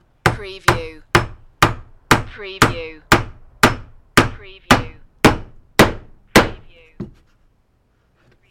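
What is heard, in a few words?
Hammer Nail Hits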